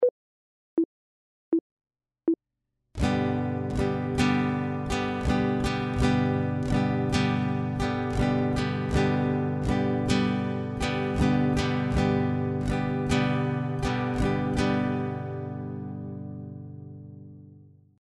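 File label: chord, guitar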